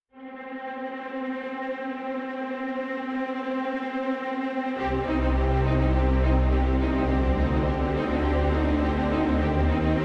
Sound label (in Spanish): orquestal emocional